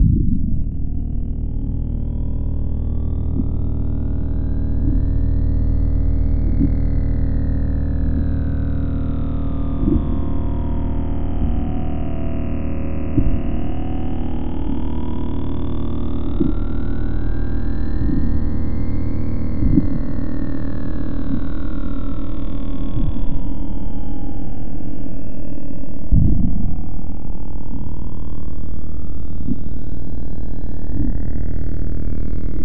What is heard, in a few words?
TRANSFORMATION BEAM; Sonic Foundry (Sony Creative Software); Vir Unis Infusion